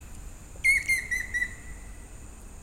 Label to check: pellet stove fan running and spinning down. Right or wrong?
Wrong